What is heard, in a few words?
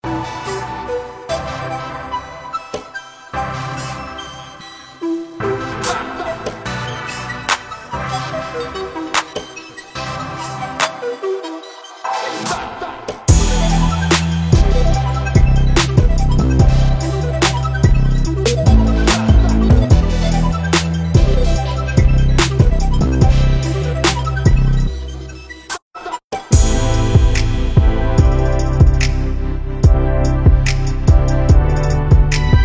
Analog Dreams; Native Instruments